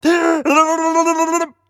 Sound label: King Taunt; Character King Taunt